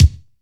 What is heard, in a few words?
airy kick drum d sharp; D sharp key; one shot